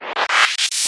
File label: magical power up